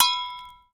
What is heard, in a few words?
Hit Glass Jar Clink; SFX Kit; Foley - Misc